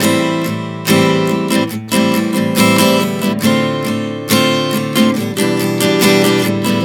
Progression 070 Am7-Dm7-Em7, Acoustic Guitar, Chord Progressions 070 bpm; Sonic Foundry; Songwriter Acoustic Guitar Companion